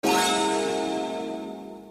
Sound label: Harp; Sailor Mercury